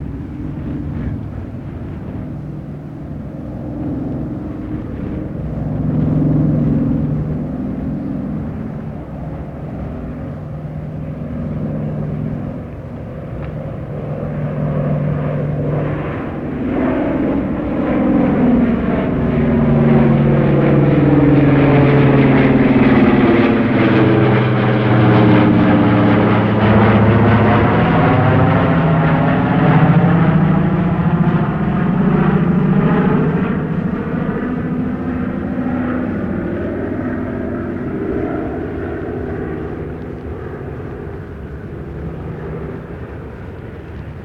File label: B29 Take Off Front Mic, b-29 superfortress; B-29 Take Off, In Front Of Mic